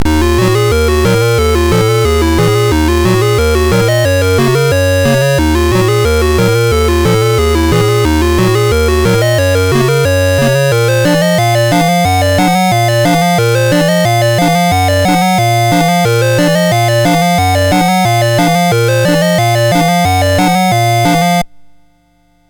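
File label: Mountain King, MOS 6581 SID chip music, games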